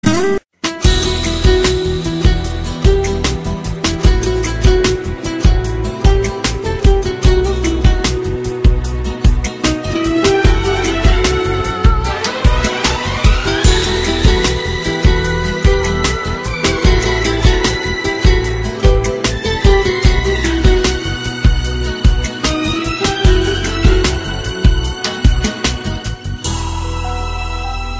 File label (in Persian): Ringtone Bikalam; Sad